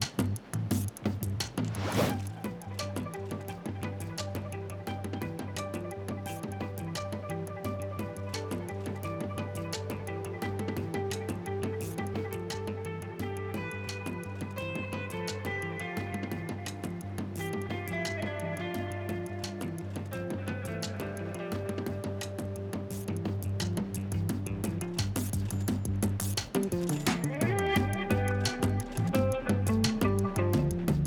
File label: Repeater Guitar sketch (no rhythm guitar or melody synth + fade to drums); mcsm music; Netflix